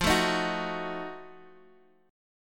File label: F 7th Flat 9th F Guitar Standard; strummed; chord; F7b9; 13,12,13,11,x,x; JGuitar